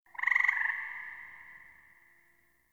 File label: Forest Distant Frog Call; Ambience; Warframe; Grineer